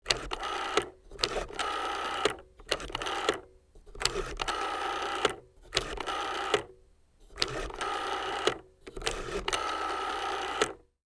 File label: Rotary phone dialing sound